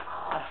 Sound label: Loud Exhale